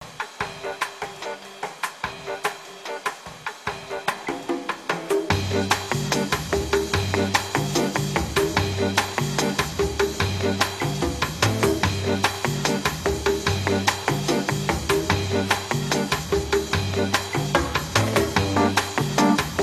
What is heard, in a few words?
Samba